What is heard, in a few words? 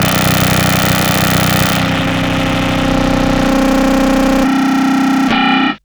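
RUMBLE right, Hard & Loud Techno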